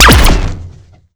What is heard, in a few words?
Laser Rifle; Angry Goy II; SoundEffects